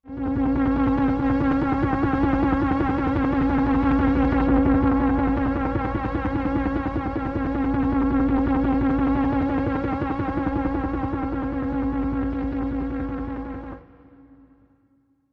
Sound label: Stress Test Machine Vi; scanners